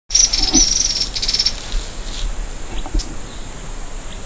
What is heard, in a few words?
Serpophaga nigricans; Ceibas